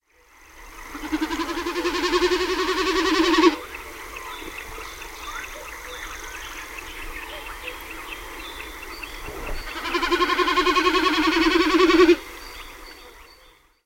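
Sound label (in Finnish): taivaanvuohi, lintuäänet; Kosteikot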